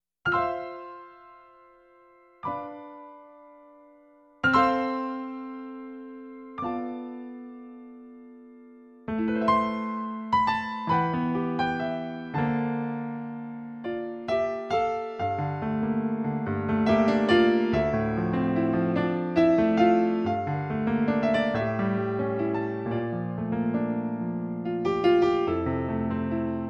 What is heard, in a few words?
newage